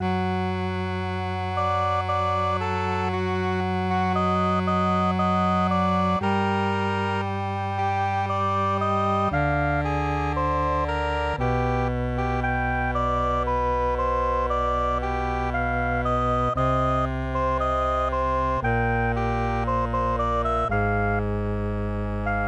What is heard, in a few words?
MIDI